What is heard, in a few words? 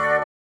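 synth electro 140 A; Techno; Keys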